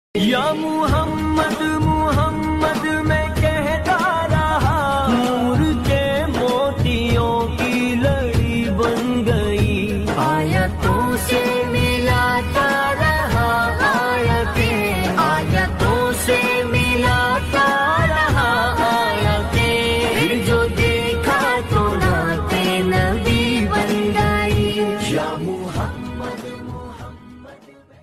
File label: Naat Ringtone